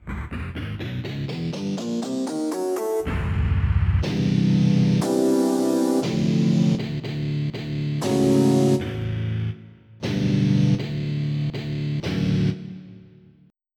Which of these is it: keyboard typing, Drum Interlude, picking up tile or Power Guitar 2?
Power Guitar 2